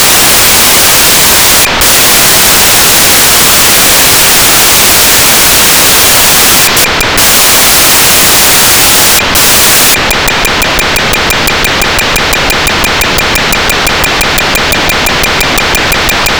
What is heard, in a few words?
ERMINAZ-EM